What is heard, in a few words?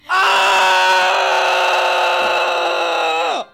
ahhhh